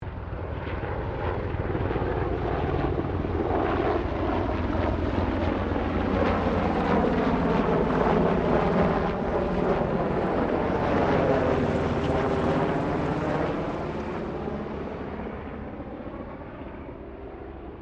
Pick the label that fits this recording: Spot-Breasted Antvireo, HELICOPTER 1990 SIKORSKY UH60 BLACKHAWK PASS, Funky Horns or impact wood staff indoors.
HELICOPTER 1990 SIKORSKY UH60 BLACKHAWK PASS